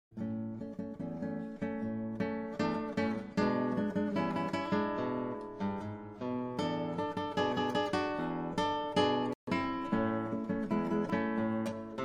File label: Ragtime